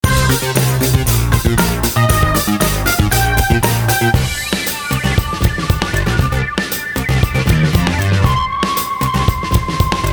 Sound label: Electro; Cues for Film and TV